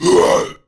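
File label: mob boss attack; Monster; Bossmob; 매산학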